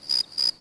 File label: crickets; Civ4 warlords